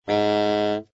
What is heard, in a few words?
Bb3 moderately loud, tenor saxophone; Bb3 dynamics